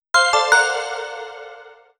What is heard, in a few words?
Alert; FiFam 3 Green Alert; Sony Ericsson; PlusFourSix; Filippa (C901)